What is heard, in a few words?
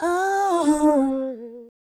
VOX, VOCALS; Ueberschall; Jam Box